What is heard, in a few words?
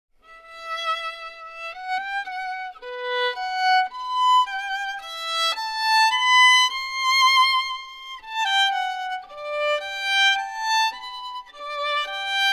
Violin; Assassin's Creed Syndicate